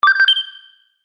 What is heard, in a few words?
Science fiction Activate Sound; Sf Activate Sound